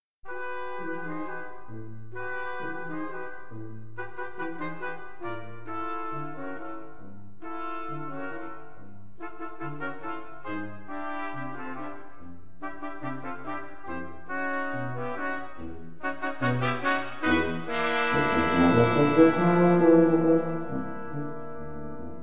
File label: Brass Menagerie